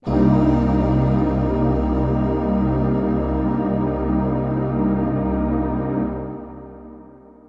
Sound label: SYNTH PAD AMBNT 160 C, Ambient; eJay; Sphere